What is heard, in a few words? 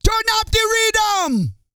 REGGAE, Vocal Planet, World Voices